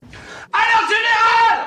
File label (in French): sonnerie alerte generale